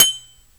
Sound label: Dekanter, Household; Convolution; NI Kontakt 5